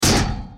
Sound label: equipment rune